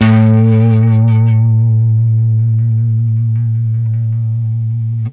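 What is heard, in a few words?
blues long vib, Guitar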